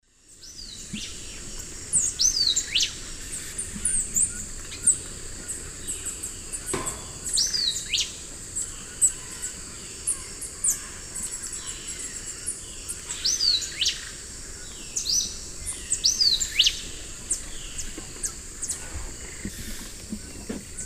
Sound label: Euphonia chrysopasta, 2 call types; S. America; Eastern Lowlands